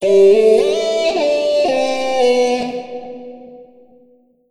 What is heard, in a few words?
phrase C, Vocal; Slate Digital Omnivox Vocal Sample Pack